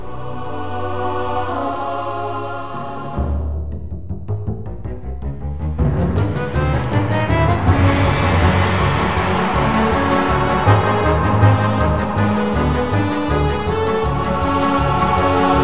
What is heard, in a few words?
Theme Loop; World of Goo